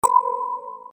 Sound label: fling; filler; Puzzle